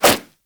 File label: nunchuck whoosh; Warframe; Infested; weapons